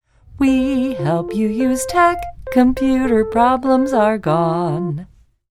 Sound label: Jingle